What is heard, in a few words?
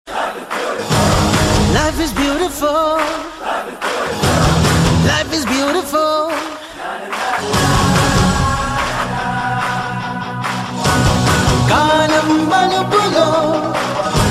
Beautiful Pop